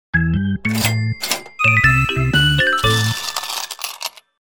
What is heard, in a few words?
deng sms